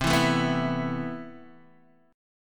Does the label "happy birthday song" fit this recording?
No